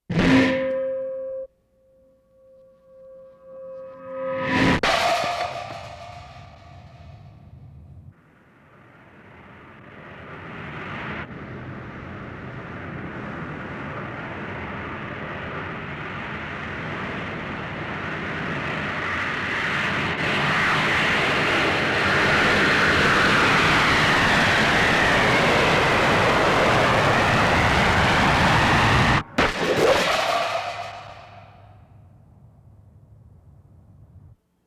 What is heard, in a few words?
plane